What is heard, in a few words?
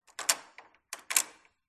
household door latch lock turn; zapsplat